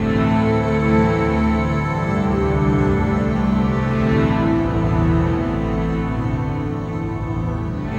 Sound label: Acoustic Drone, Long Loops; Sonic Foundry (Sony Creative Software) - Friendly Fire; DroneLoop